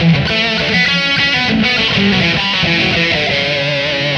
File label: lead, 100